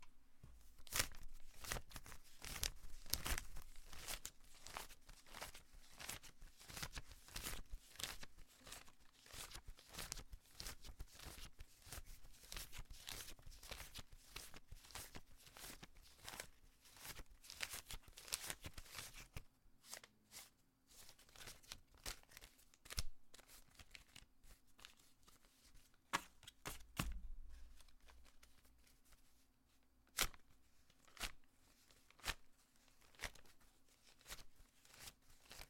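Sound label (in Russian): перемешивание купюр обычным способом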